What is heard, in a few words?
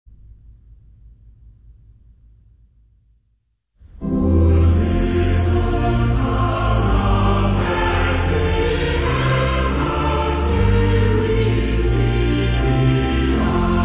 Cathedral Choir